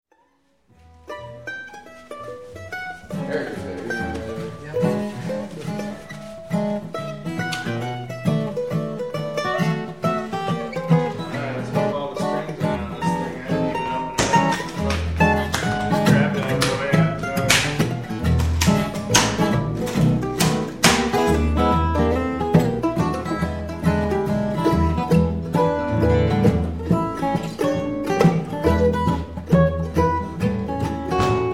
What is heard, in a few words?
Deep River Blues